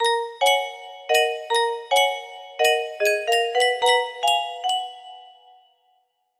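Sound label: happy jingle, music box melody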